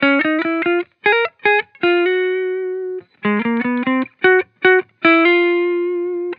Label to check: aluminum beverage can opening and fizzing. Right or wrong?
Wrong